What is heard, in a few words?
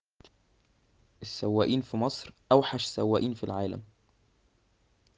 traffic